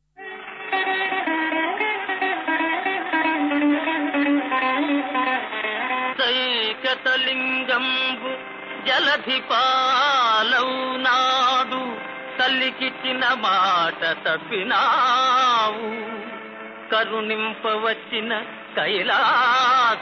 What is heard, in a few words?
Padyam; BhuKailas